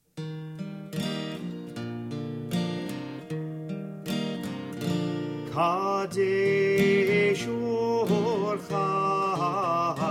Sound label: Kadesh Urchatz Guitar; passover